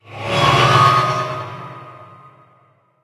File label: Hellish pact; Oloch